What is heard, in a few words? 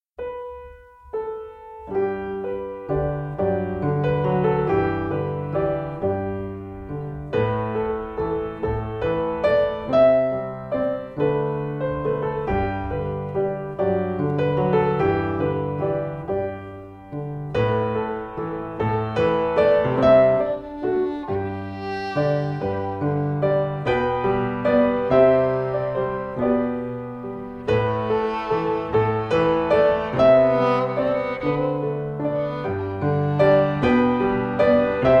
Waltz, fiddle; Tombigbee